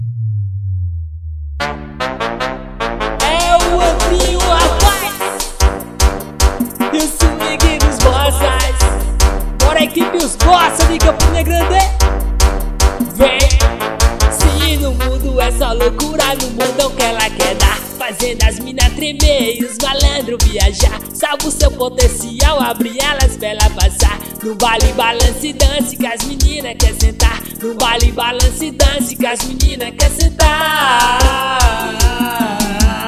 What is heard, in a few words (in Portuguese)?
base arrocha